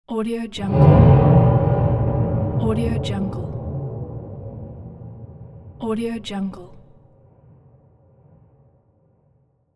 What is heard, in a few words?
horror impact; background-waves